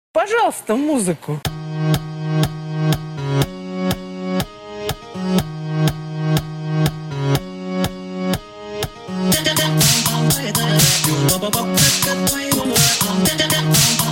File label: Hard Dubstep; fromOsu